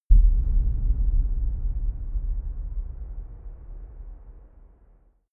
dark outer space shadow, hits impacts

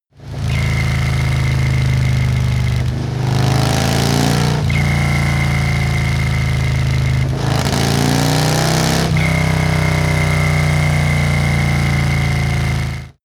motorcycle harley accelerate triple whistle; motorraeder; verkehr transport

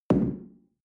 shell place on tablecloth hard; berufe; gastronomie; soundpool

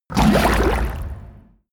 kraken growl short; kreaturen

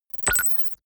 confirm micro click; maschinen; signale; soundpool

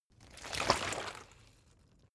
bottle water sparse upend; berufe

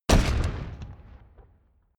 metal spike

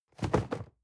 cardboard box medium fall low soft; objekte

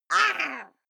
female fight shout pain

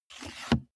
car bmw e46 interior sun visor mirror slide soft

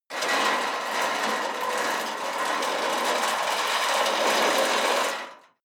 metal big light scrape long

